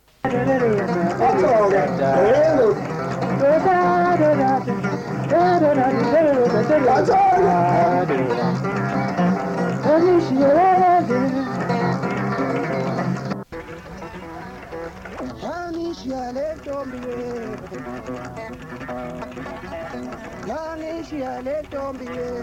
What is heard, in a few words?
Maskandi Interpretation